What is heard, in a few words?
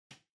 Light Switch Rocker (Far Dry Gentle)